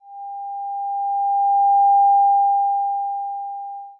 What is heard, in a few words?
moving sine